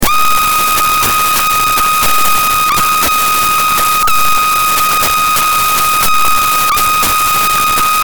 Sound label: fm7 patches